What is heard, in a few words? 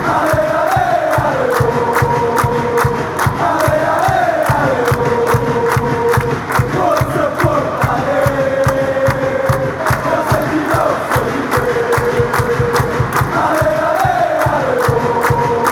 allez allez allez oh, fanchants; pfc